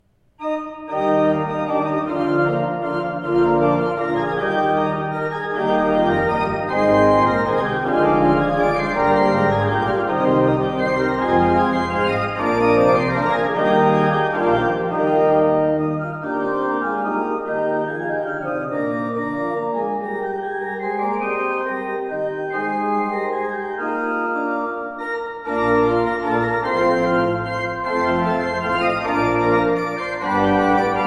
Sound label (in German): Improvisation, Ahrend Orgel; improvisation ueber jesus ist kommen; kirchenmusik